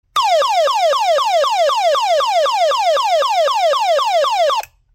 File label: MS135T Police, beacon light; meltric